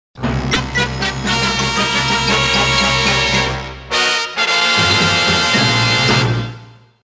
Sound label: Radio Newscast Outro Jingle; WJBK-AM; Radio 15